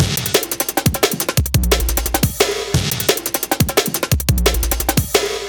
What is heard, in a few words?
175, classic dnb breaks